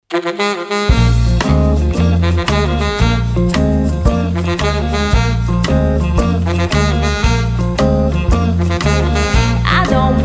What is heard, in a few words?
Blues Cover Band